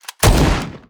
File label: skill shooting super90